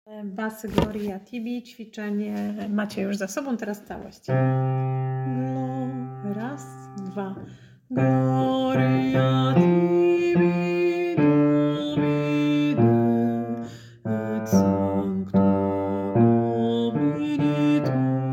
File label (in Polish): Bas Calosc; Gloria Tibi Domine